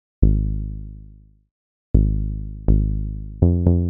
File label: 122 BPM Bass Synth C maj A min Tonic G; Pro Bass Synth Studio Kit; MMB